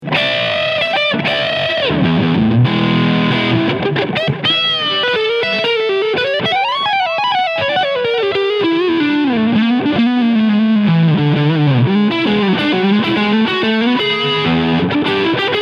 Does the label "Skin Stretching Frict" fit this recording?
No